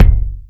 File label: tight kickdrum g key, tuned drums g key